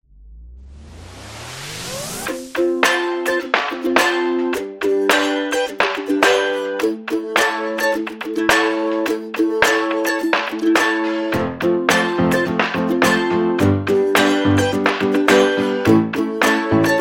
Upbeat Uplifting Ska Rock

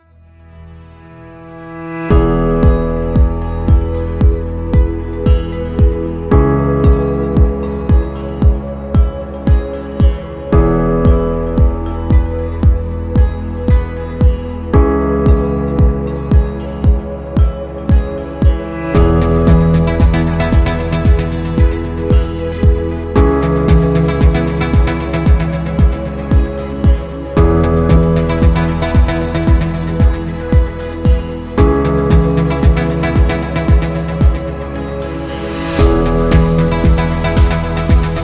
Health Ambient, corporate music; corporate music vol 13

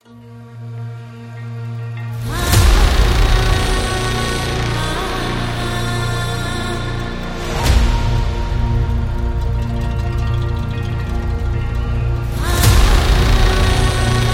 Arabic Epic; ramadan 2025

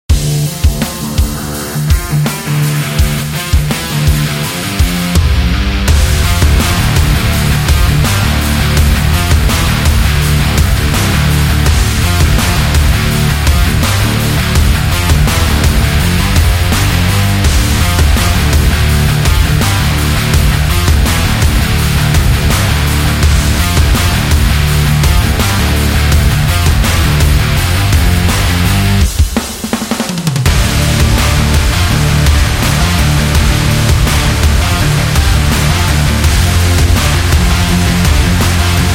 Hard Rock Action

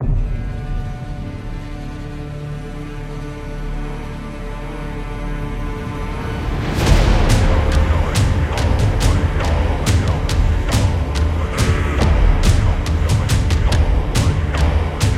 Ritual

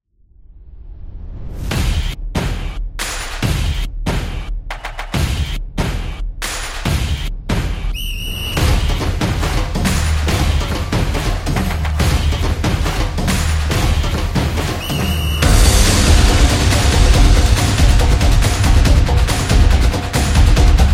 Soccer Drums; soccer stadium

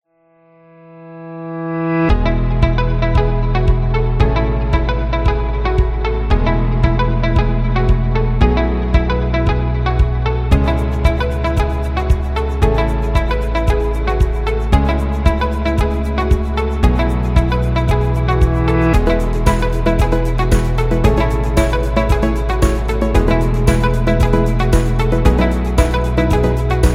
Background Minimal; corporate music vol 12

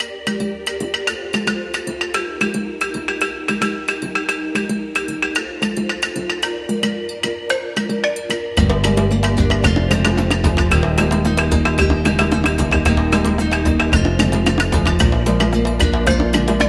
Africa Happy; sounds of the world

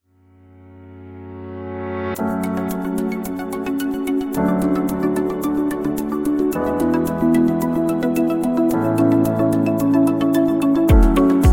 Confident; corporate music vol 14

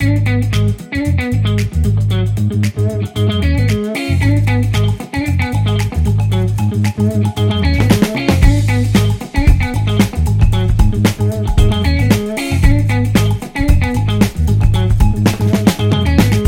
Happy Funk; casino

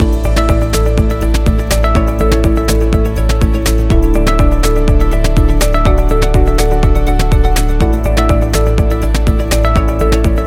Tech Loop; technology

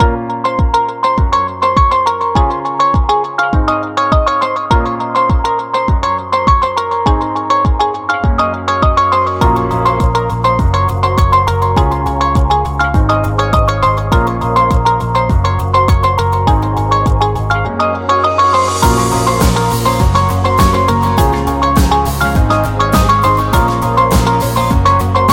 Inspiring Corporate; corporate music vol 22

